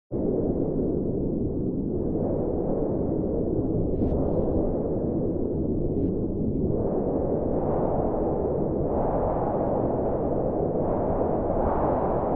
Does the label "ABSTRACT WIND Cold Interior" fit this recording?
Yes